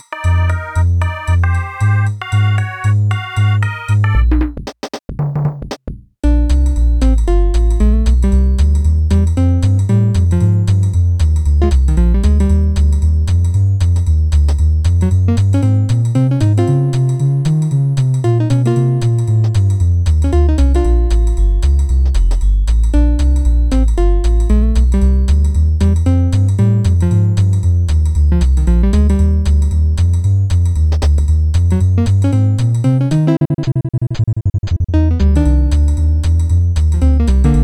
Jazz (Aircheck, Hi-Fi)

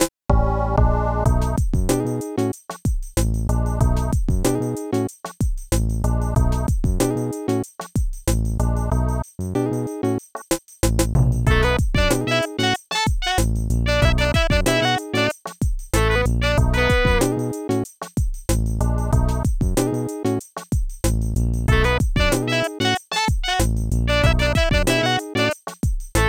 Groove, Hi-Fi

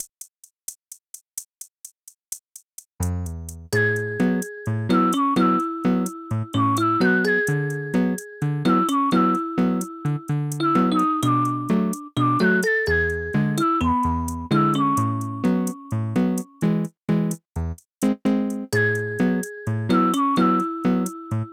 Bossa, Aircheck, Retro

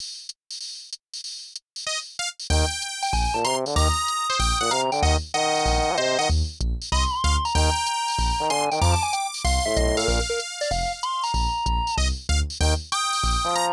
Aircheck, Retro

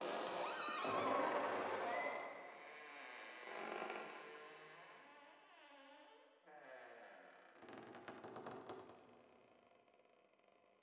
Creaking Soundscape

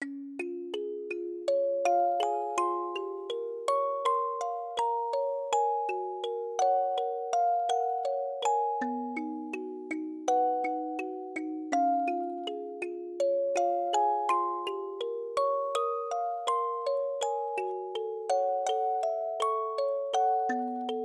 Music Box

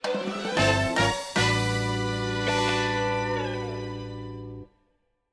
Fanfare; Dodo Airlines

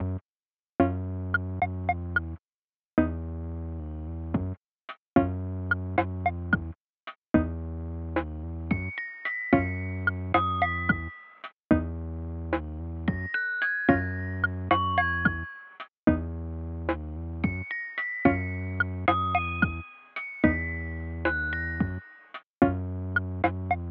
Aircheck, Phono

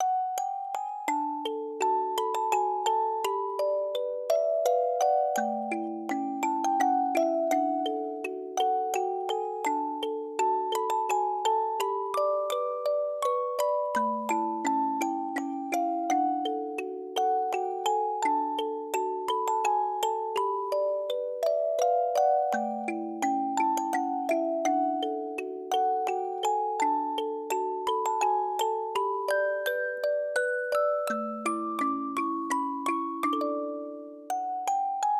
Music Box

